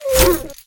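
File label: Cerberus Wound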